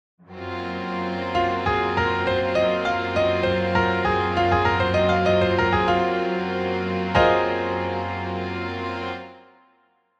Lydian pentatonic

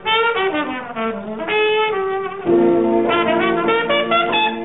ii V I

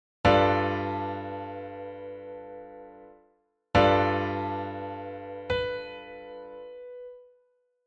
chord tone

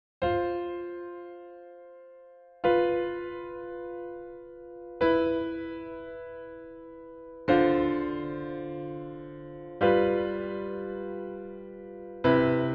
2 note voicing for ii V I

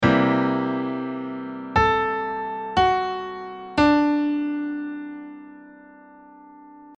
DMaj over C7

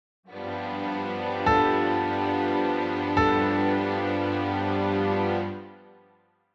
music audit upper chord tones

